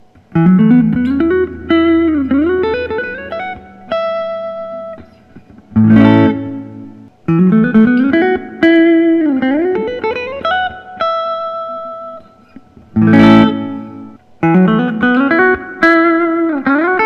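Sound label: paul reed smith mccarty 594 semi hollow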